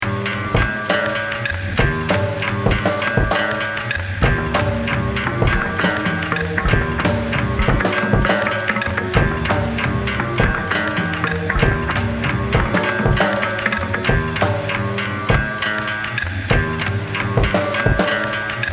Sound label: Pakdrum